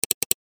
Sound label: computer mouse doubleclick